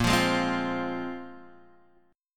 Bb 7th Suspended 2nd, Guitar, Standard; x,1,3,1,1,1; Bb7sus2 strummed; JGuitar

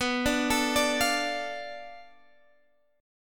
B Minor 7th Flat 5th B Guitar Standard; Bm7b5; arpeggiated; x,14,12,14,15,13; JGuitar

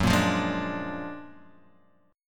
F sharp Augmented Major 9th F sharp Guitar DADGAD

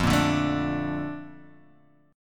Bb Major 7th, F

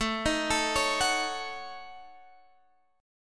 A 6th Flat 5th, Guitar Standard, chord; arpeggiated; JGuitar; x,12,13,14,14,14

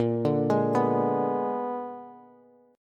Bb 7th Flat 9th Bb, Bass; Bb7b9; arpeggiated; JGuitar

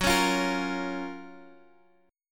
F sharp Major 7th, Guitar, Open F; F#M7 strummed; x,x,6,8,8,5; chord shape

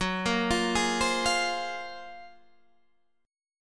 Gb Major Sharp 11th, Guitar Standard; GbM#11 arpeggiated; JGuitar

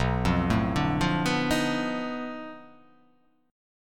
B 7th Sharp 9th Flat 5th, down

